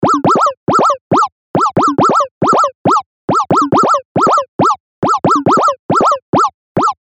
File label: Laser Loop 138 BPM; Laser Loops